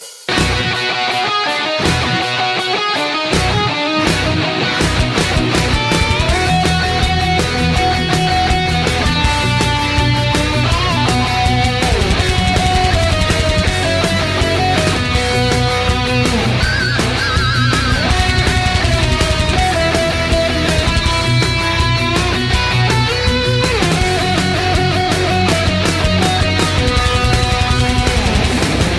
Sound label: christmas metal